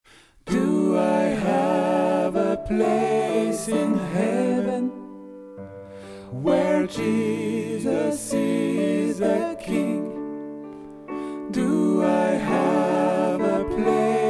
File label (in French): Tenors et basses, Gospel